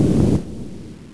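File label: FLAMETHROWER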